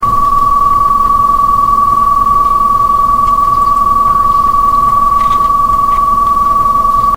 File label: whine